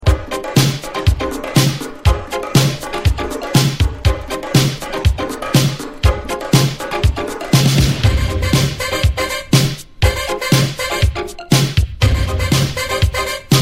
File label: yamaha dx7